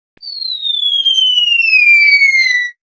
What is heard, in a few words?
Slide Whistle